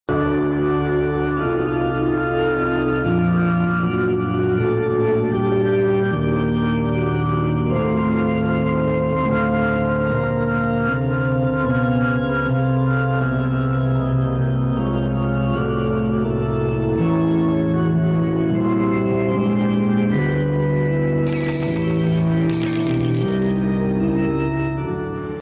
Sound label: organ; The explosion is followed by organ music